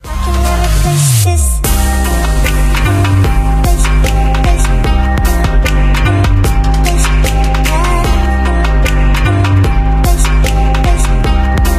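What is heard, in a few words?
background music crossfade; Invaxion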